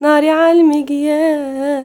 GIRL, GIRLVOICE, Arabian Traditions

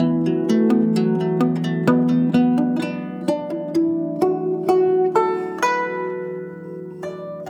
Traditional Guzheng; Continental Drift Aftershocks

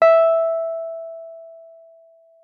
WURLITZER, E5; Xsample VOL-1; 90 s Sample CDs; WURLITZER RS

